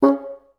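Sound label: staccato C3, Advanced Orchestra; Best Service; Peter Siedlaczek's Advanced Orchestra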